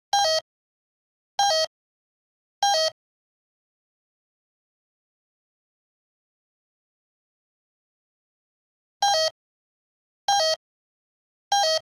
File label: bosch alarm